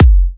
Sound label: Electro Kicks